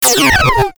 Futuristic Gun Sound; FXHome; Bullet and Gun

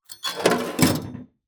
Scrapes and Scratches, Metal; SoundBits

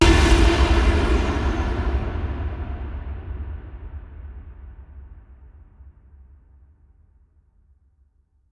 Far Hit; 99Sounds; Rumore Cinematic Impacts

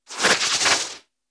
Bullet Time; FXHome; Bullet and Gun

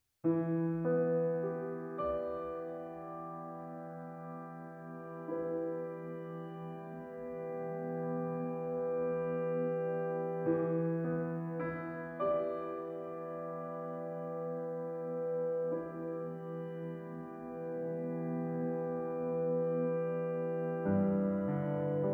Piano Monolog

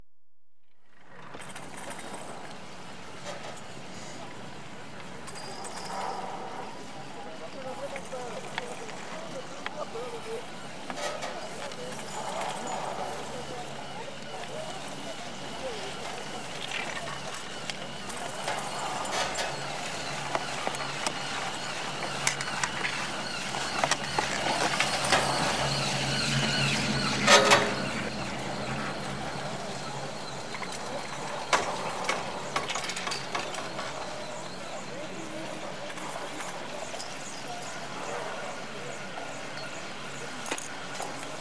Chairlift Ride Recorded On Lift; BBC Sound Effects Library; Adventure Sports

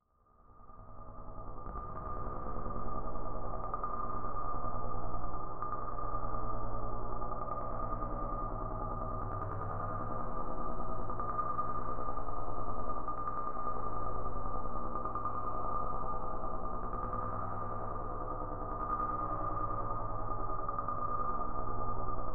Magnetic Field; 99Sounds; Magnetic Fields

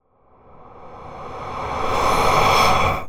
Ghost Whoosh Male; Fox Audio Post-Production

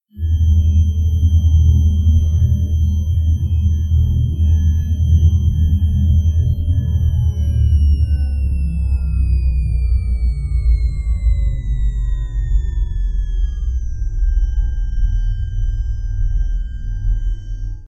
generator down; FXHome

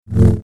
Double Bladed Saber Sound; FXHome

